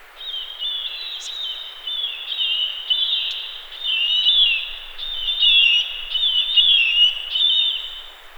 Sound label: marbled murrelet